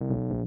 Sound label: error; downthemall